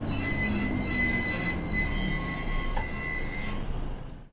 train brake, machine

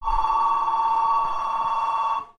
monorail bell

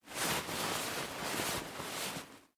rustling of clothes

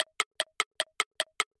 quiz timer

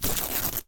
clothes torn

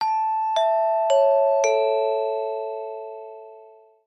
broadcasting end

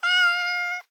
cat cry

